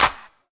clap sound c key, hand clap sound tuned to the C note. Loudest frequency: 3105Hz; percussion; single hits one shots